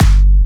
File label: Jump Kicks, Bassdrums; Vengeance - Essential Clubsounds Vol.5